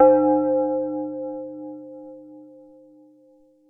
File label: Roland JX-8P Bell C5